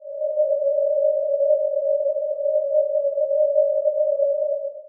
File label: Martian Atmosphere, Sci Fi; 1001 Sound Effects